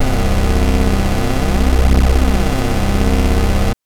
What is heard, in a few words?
Synth Ensemble c0; TrashLibrary